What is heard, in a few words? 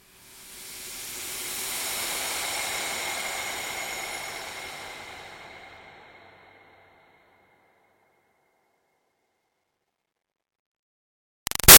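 Swell Airy Toxin Steam Release Medium; Sound Design; Swells; Big Room Sound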